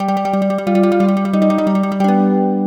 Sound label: Bard Solo Fun